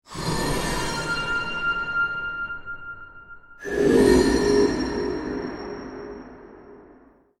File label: Stinger Horror Musical Scary Piercing, Stinger Musical Scary Piercing Metallic Trailer Transition; Stingers; Sound Design